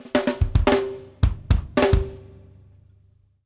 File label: OFF SNARE, DRUMWORKS FILLS 108